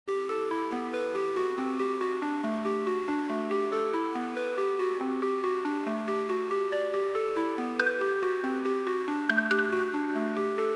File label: trap beat